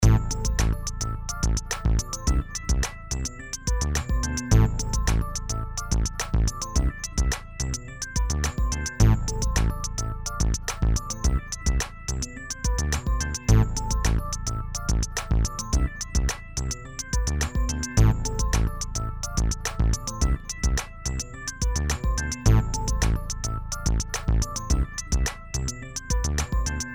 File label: beat